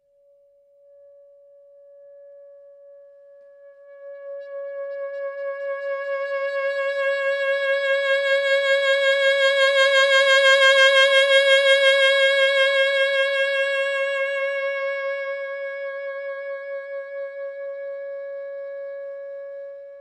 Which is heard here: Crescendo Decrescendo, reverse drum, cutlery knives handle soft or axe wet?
Crescendo Decrescendo